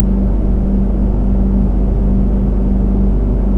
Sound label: bay ambience; walker bay; Quake 4 Alpha; id software